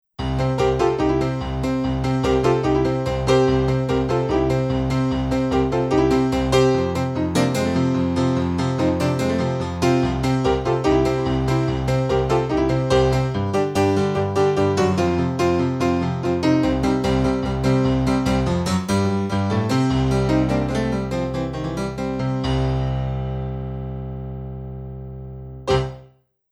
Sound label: jd piano